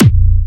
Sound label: Fatterr - A#3, Kicks, Big Room Kicks